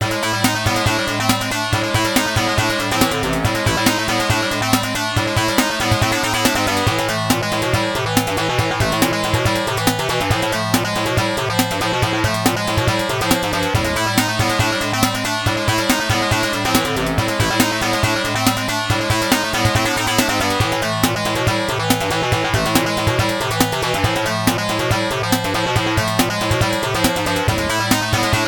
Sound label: (FM Sound Source Version); Hydefos